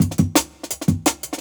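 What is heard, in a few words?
Jungle Breaks, 170 bpm; Original Jungle Breaks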